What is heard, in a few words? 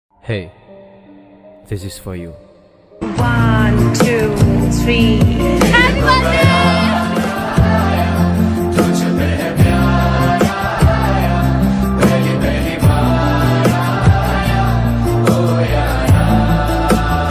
happy birthday ringtone; birthday wishing